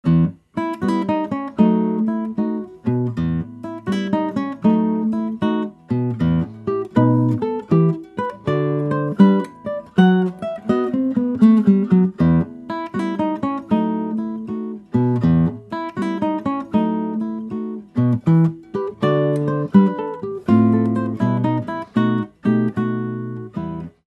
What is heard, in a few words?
Funeral March